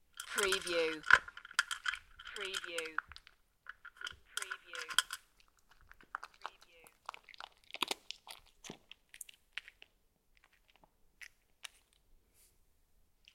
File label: Cat crunching biscuits and chewing; beanstalk audio